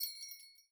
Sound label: button auto play; netent